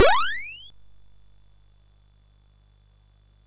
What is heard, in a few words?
sid MOS6581R3; Toobin